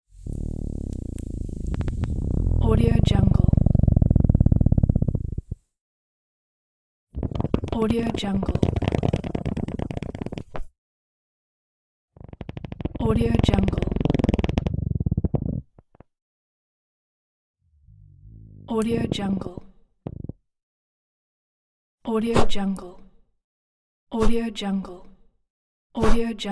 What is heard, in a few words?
match extinguishing